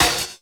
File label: SD, Drum & Bass, 150 BPM; Ueberschall; Drum & Bass Resonance